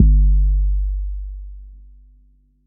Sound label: phonk kicks oneshot - 808 g sharp, Specially designed for phonk type beats, these nasty, layered 808; kick drum